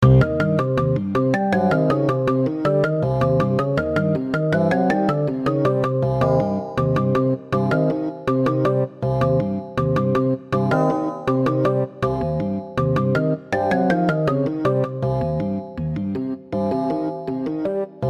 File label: Organ Grinding